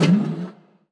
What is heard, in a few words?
Aircrafts Engines Packard Merlin Prop; DCS